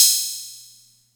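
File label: Atlantex Music Percussion Computer hi-hat open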